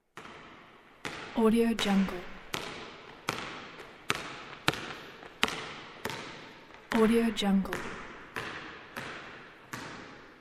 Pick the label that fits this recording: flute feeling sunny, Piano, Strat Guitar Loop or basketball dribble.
basketball dribble